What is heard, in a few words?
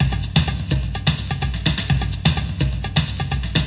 130 bpm, tom loop